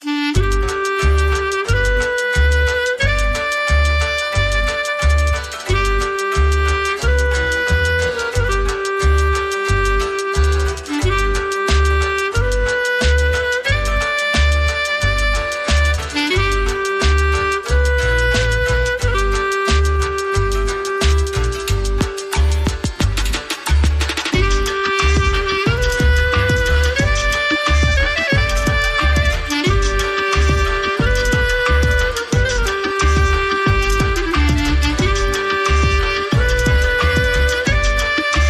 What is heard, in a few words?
Klezmer Drum n Bass